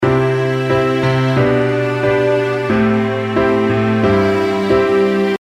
strings

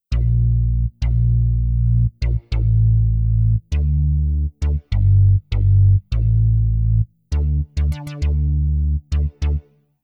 Synth Bass

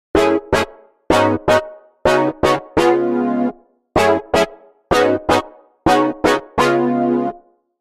Synth Brass

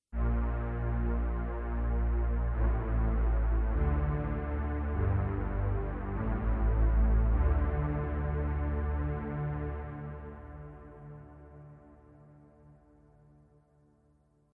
Sub Bass

